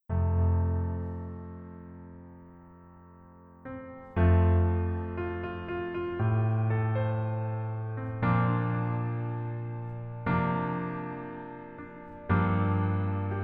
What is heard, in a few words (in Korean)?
Piano ver 멜로디 MR 남자 키